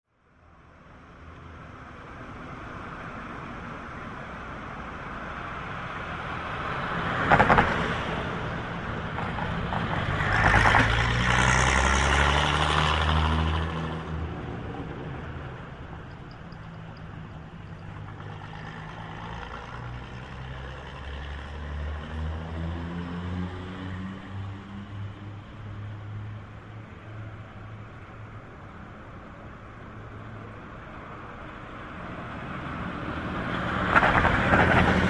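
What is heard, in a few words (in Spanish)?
calles paso nivel ferrocarril; av o lagos y fontezuela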